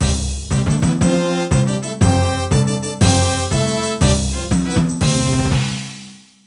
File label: unlocked; Infero Adze